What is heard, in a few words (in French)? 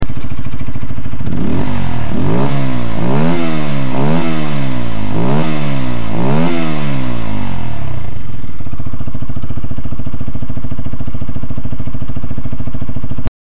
echappement; MICRON